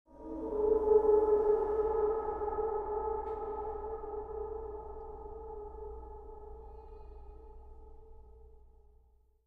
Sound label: MUSIC